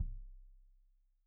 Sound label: Kik Stereo; Deeply Sampled Drums; SMDrum